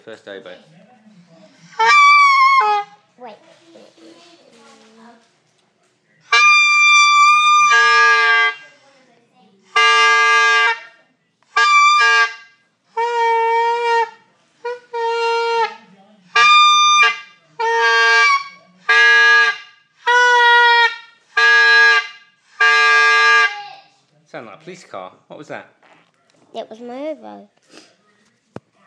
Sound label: oboe